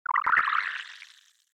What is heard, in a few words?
tone